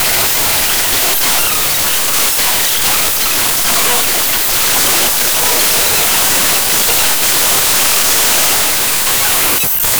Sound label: glitch, error